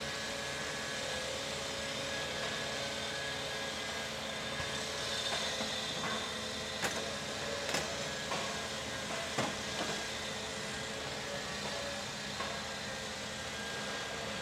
AMB, construction site, 50 yards, large, open, foundation, grinding, digging, pounding, heavy machiner; XY; heavy machinery; Eiravaein Works; Vaeyan IV